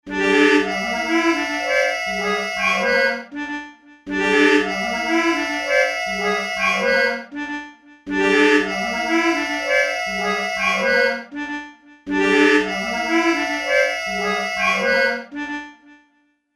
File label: Reed Organs pattern; Reeds; Mayhem